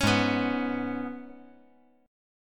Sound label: Ab Minor Double Flat 5th down; Abmbb5; strummed